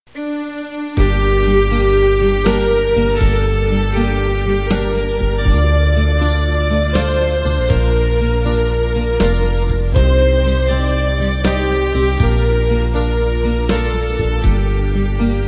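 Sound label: soprano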